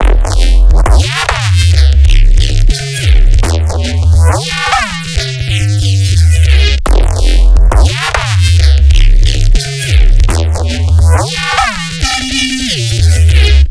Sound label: F messy old school reese